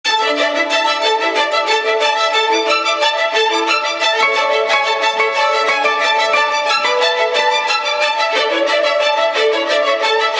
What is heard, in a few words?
Ring Vivace; Vertu Constellation Quest